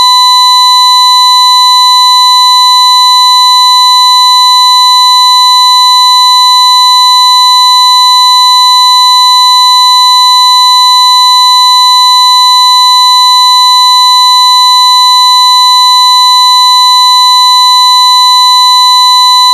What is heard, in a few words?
1 4khz